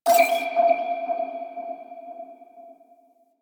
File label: Link Bleep; Warframe; Squad Link; Sentient